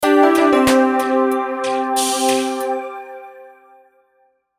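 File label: Musical Electric Keys Rhythm; Keyboards; Blastwave; Sound Effects:Musical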